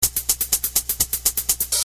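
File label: hihat loop; Strong Bad's Cool Game for Attractive People; dangereque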